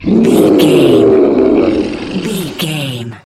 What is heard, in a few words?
troll monster battle groan